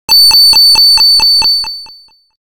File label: alarm frenzy, wake up tones